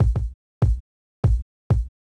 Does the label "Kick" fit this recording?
Yes